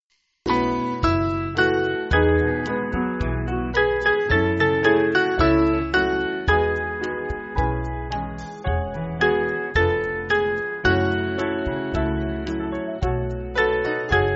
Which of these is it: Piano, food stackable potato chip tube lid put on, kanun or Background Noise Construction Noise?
Piano